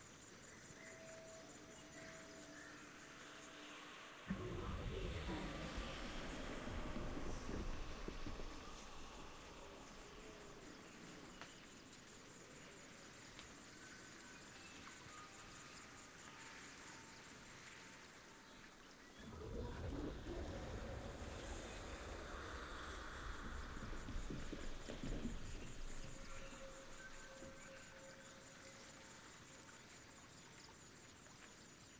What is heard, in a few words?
amb ixtal ruins